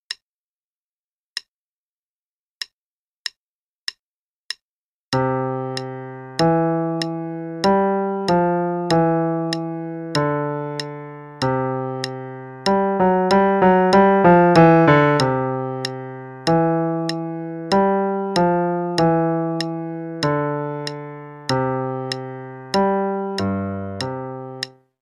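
Play-a-long, Sonatina in C Major, left hand, quarter note=96; Latour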